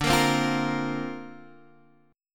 D-Minor 7th Sharp 5th D Guitar Half Step Down, chord